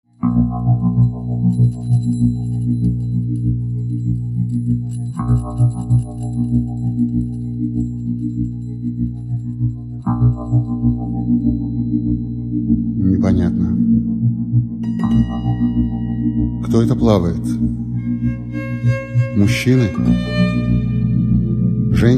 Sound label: orchestra